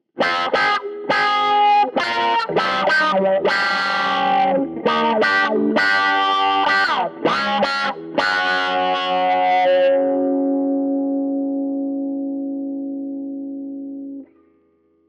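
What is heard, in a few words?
wahwah, guitar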